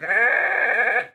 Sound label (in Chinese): 山羊冲撞前声